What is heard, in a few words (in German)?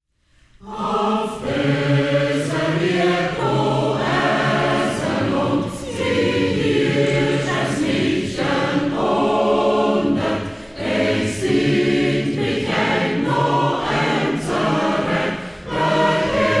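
Heltauer Liedertafel, Ortsmundart; Umgangssächsisch; Af deser Iërd; Hermannstadt; Hegt wird gesangen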